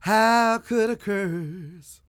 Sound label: croon, Vocal Planet Blues Soul; Spectrasonics